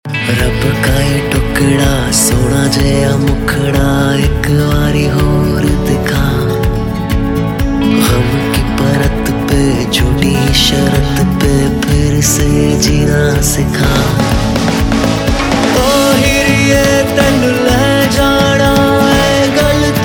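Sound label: Ringtone, Punjabi Pop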